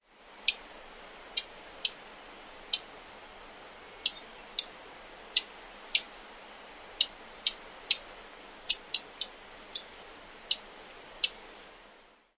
chewp calls; NY

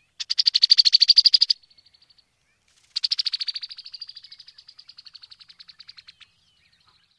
female chatter

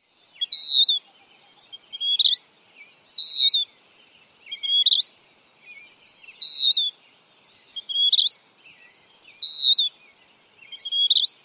dawn song

